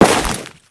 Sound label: Crewman Step Ice, Footsteps; Warframe; Corpus